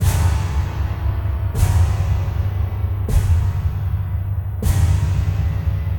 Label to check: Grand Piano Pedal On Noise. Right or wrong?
Right